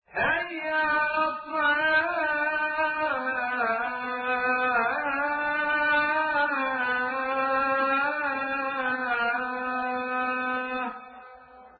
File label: azan; Spreadtrum source code audio